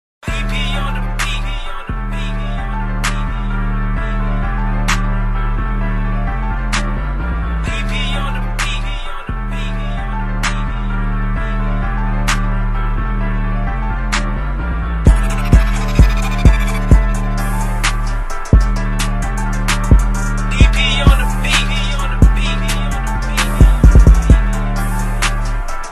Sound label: instrumental